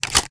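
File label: shotgun reload loop fast shell in; reloads; iw8; romeo870; gmod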